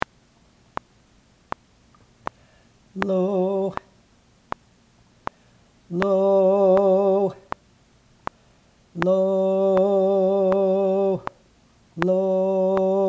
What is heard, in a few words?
cutoffs terminal vowel; remote choral tidbits